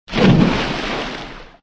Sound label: Splash; Super Mario 63